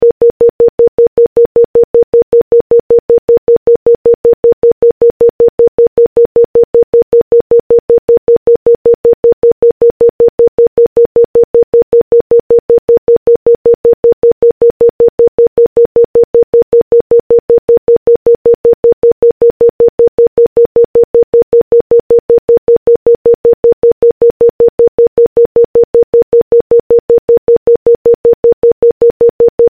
Stutter

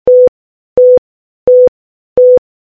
Intermittent Beeps

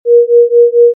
Pulse

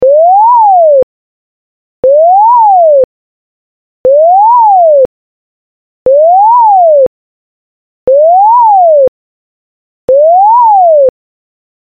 Gated Siren

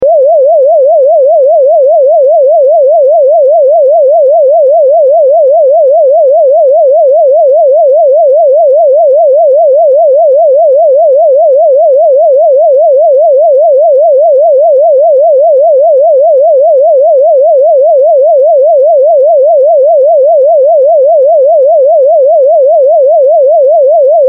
Evacuation

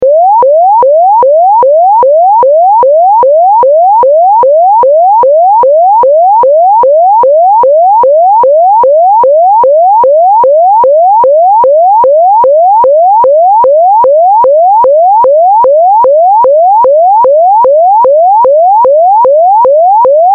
Yelp

